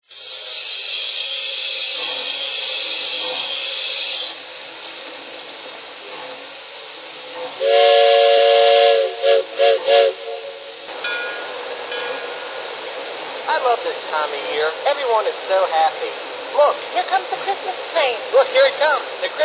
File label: 460, trains